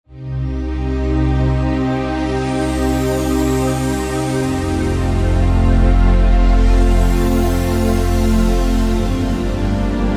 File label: Liquid String, Korg TR-Rack